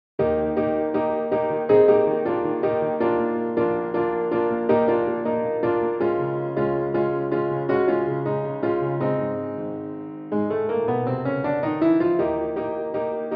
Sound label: Jingle piano track